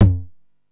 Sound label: TOM L, 909; musicradar-synth-samples; Classic drum machines; 909TOMS